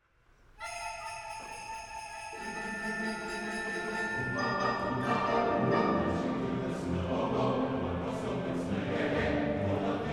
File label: Jingle Bells; Silver Memories